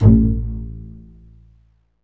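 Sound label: strings, contrabasses pizz; String Master Series; Cbs FX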